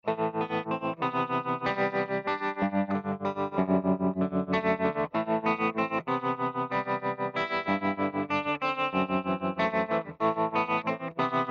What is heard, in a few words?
REDPOLL HUMBUCKER; Humbucker trem and verb demo